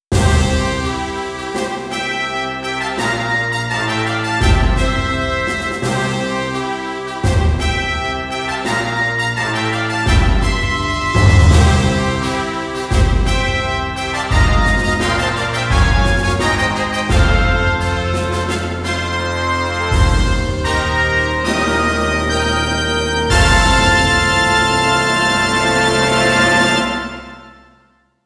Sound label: Fanfare Las Vegas